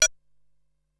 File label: Metallic Dink, Ensoniq SQ-R Plus